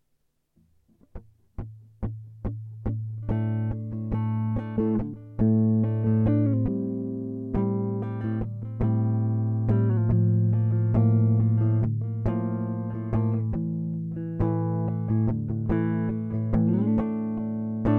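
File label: D 140 guitar groove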